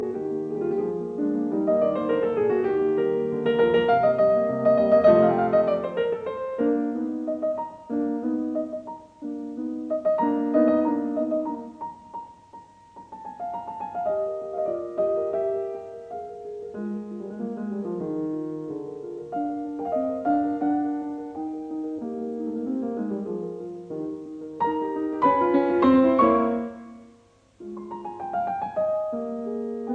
Piano Sonata in C minor Allegro Molto allegro; Lili Kraus

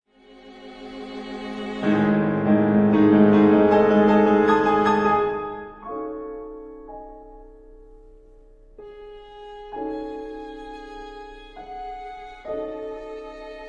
quartet for 2 violins cello piano Largo; Cowell; Scenario

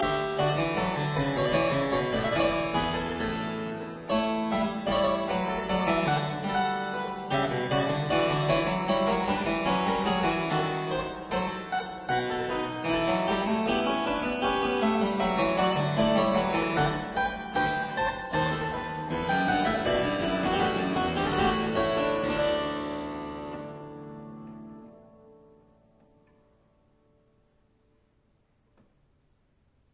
French Suite No 6 in E major BWV 817 IV Gavotte

